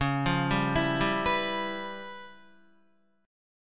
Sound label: Db 7th Sharp 9th Flat 5th Db, down, Guitar; Db7#9b5 arpeggiated